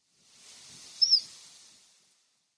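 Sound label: Horned Lark; koe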